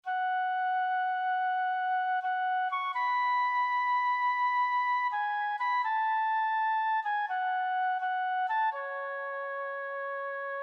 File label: flute; good friday